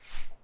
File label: Egg Shaker Throw